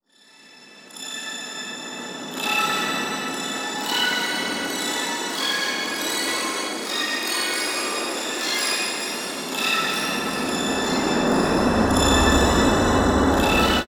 storm brew

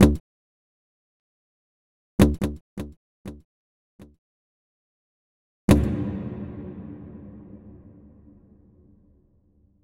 echo reverb

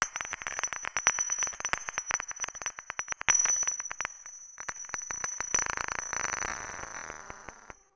bottles

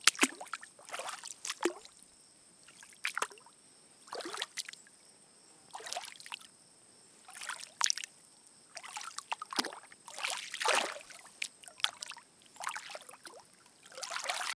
flip flap

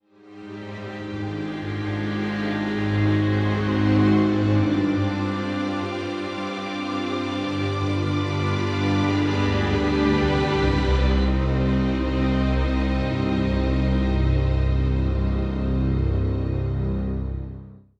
strings

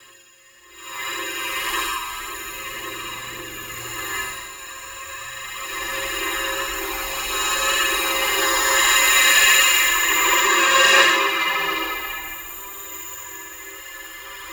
punch

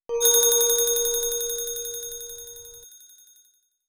ring chime

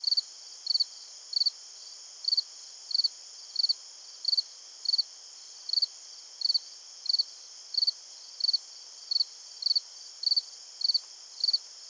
grillon cricket

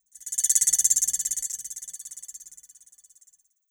high shake

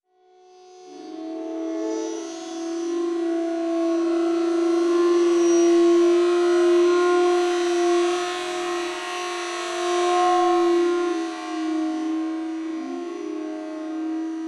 shakuhachi

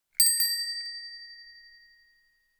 ring decay

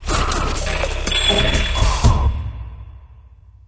techno

